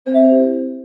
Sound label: Notification